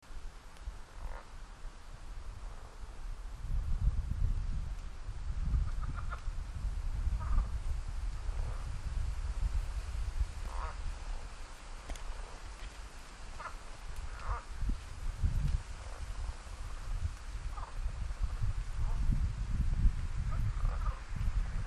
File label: Rana sphenocephala